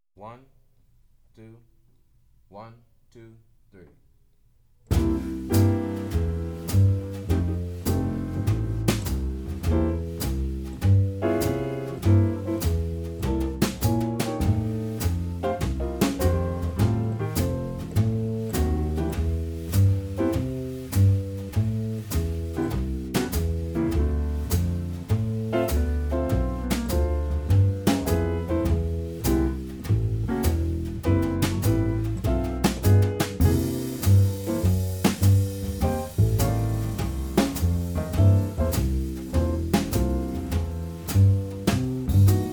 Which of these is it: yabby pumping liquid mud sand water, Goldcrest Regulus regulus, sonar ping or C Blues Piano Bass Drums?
C Blues Piano Bass Drums